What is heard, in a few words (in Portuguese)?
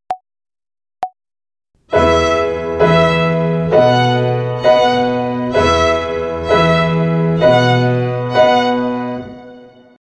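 Ginástica para o dedo 1 Corda MI Ternária, Violino; Violino para os Pequeninos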